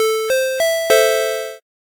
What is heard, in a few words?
square sequence